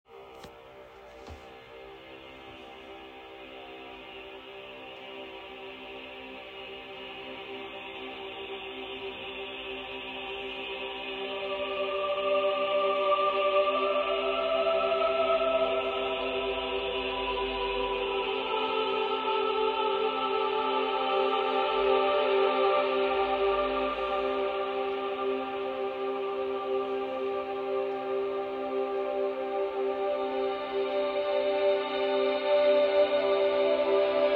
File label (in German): Entspannungsmusik